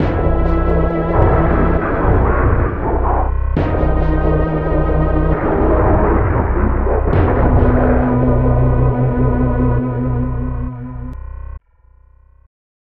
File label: Kili-Kili Voice; King of the Monsters 2; SNES